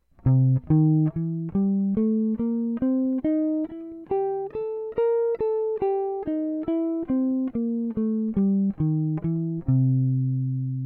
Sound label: Cool tones in C 2 octaves